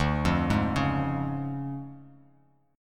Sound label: D Minor Major 7th Flat 5th D Bass Standard; 10,8,6,6; DmM7b5 arpeggiated